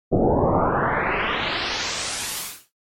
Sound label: SNES Shooter Special Weapon